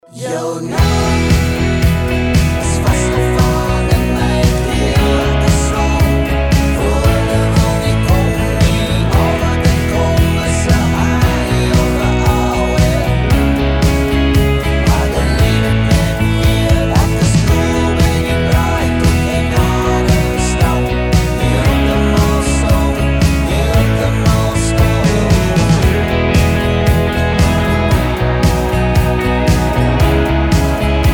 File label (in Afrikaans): heeltemal stom jakkals vibes, afrikaans pop